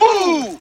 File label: "ooh" group of men; ooh ahh